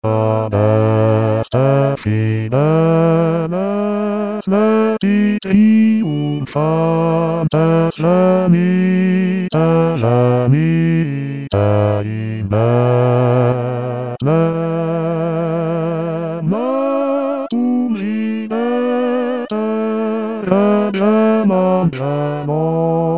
adeste fideles bajo

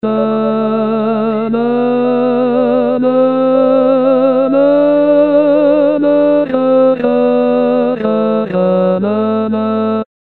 introducción tenor

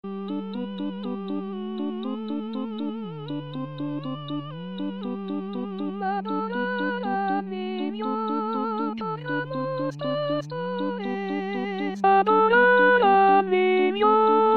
contraltos; adorar al nio

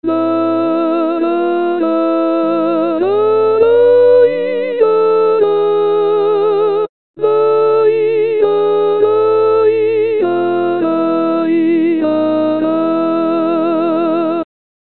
introducción contralto

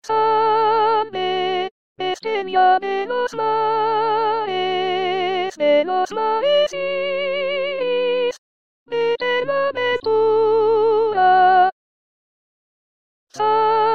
salve marinera sopranos

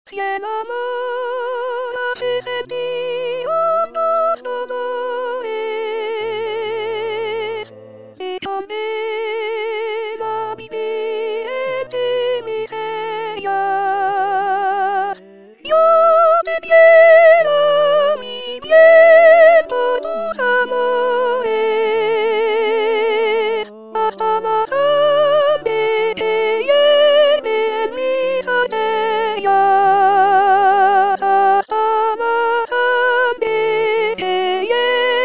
sopranos

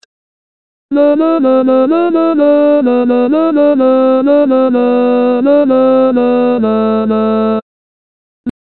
introducción tenor

